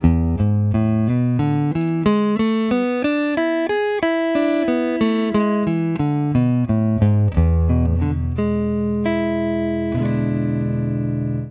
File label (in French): Penta mixo 1ere position, Guitare; La pentatonique mixolydienne